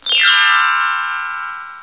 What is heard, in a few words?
button appear